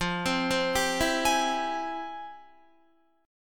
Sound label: F Minor Major 9th, Guitar, chord; FmM9; arpeggiated